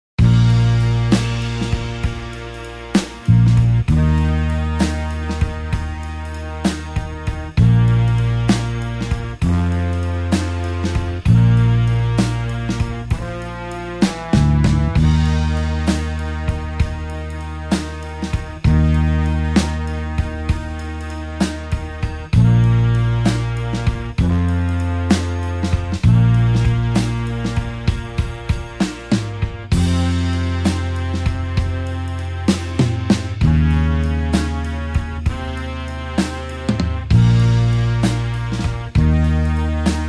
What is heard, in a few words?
blues horns slow Bb